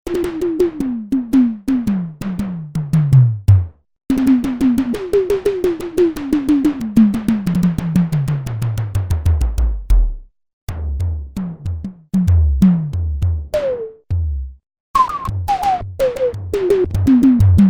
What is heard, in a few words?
ELECTRIC TOM, Korg DW-8000; Factory Patches